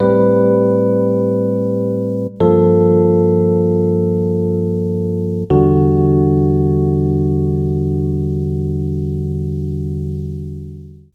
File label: Rhodes, Cinematic Funk; Sonic Foundry (Sony Creative Software); Cinematic Funk Urban Tone Poems